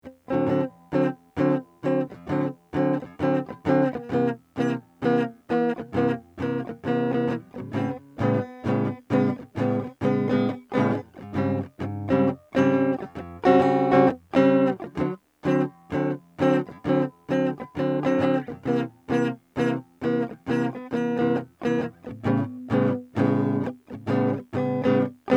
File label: 13 equal jazz; tuning; 13edo